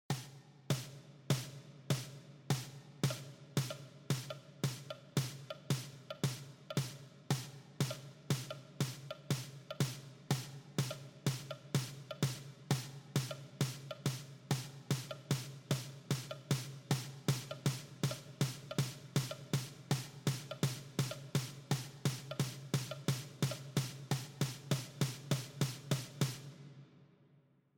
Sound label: poly scale short